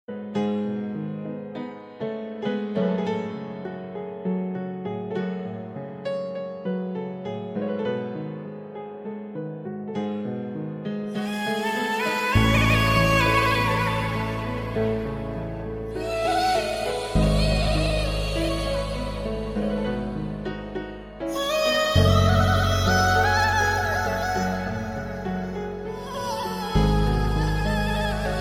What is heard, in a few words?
Sad Flute